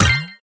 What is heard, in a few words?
collect; pinball